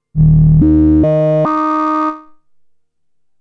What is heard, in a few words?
Behringer Neutron VCF Keytracking Bass